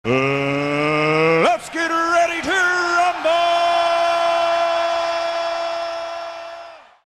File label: ready